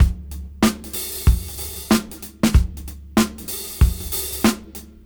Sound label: Funk 94 BPM; AMG - Keith LeBlanc Phat Butt Jams